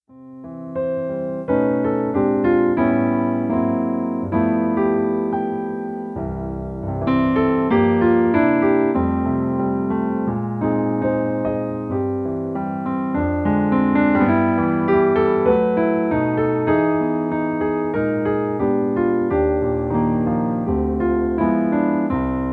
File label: Holiday Medley Piano Keyboard